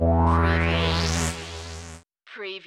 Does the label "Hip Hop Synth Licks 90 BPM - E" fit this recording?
Yes